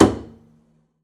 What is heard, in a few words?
pizz noise slap knuckle, double bass; dsmolken